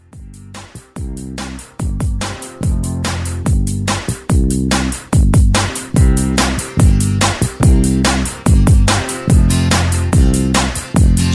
Country Re Drum 144 CLEAN

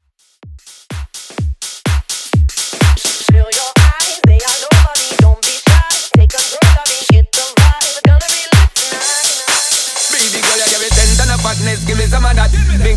House 126bpm; Workmix

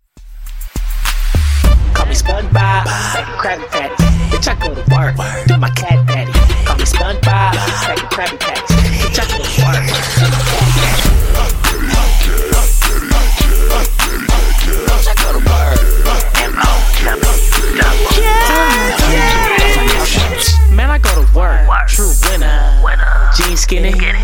Top 40 Intro Outro 102bpm

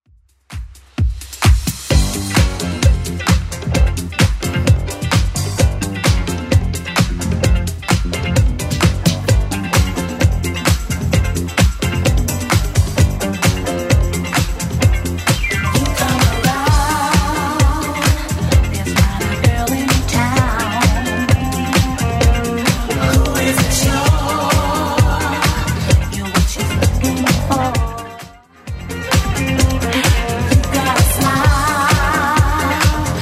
80s Club 130bpm CLEAN

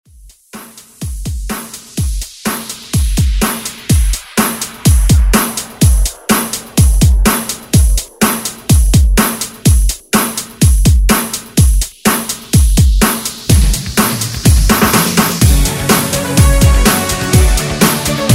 80s Redrum

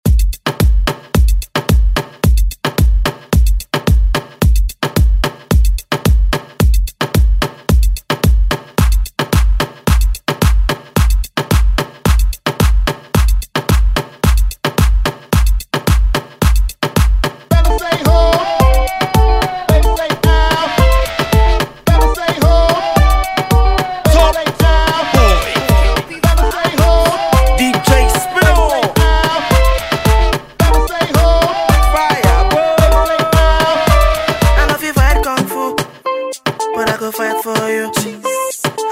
Moombahton Hype Intro

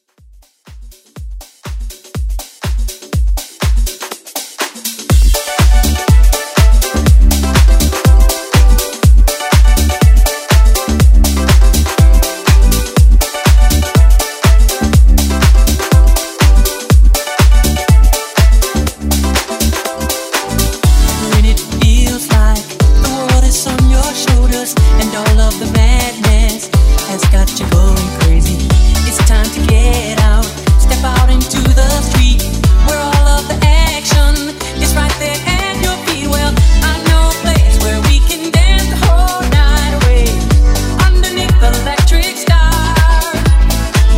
Vocal

